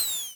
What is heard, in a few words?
Zap